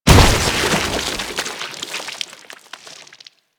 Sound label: Landscape Fungus Splat; Warframe; Ambience; Venus Open World